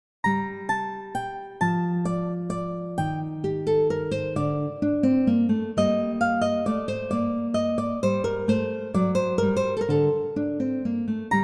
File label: menuet